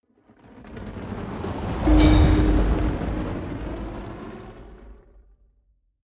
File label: whoosh magic fire bowl